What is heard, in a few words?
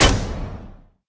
auto hit; smite; Apollo